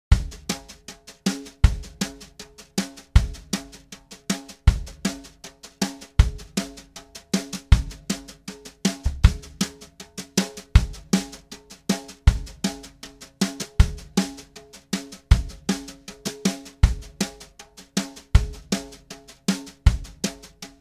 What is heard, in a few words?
Brush example